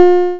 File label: hit